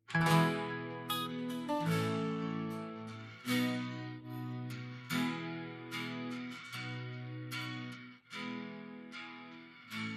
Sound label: Guitar